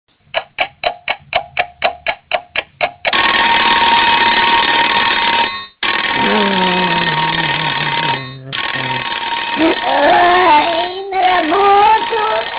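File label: budilnik, alarm clock; masyanya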